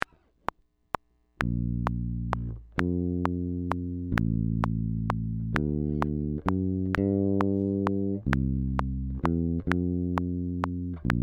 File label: bassline